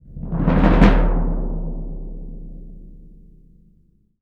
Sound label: BS DRM; ILIO; Synclavier Percussion 2; World & Orchestral